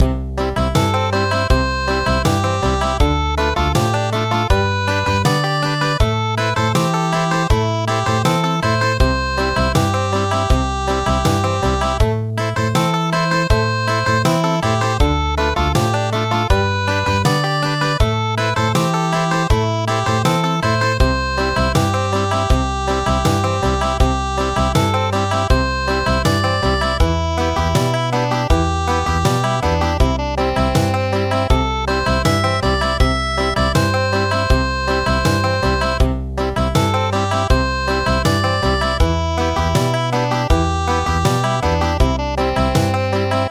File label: Country; Cha puk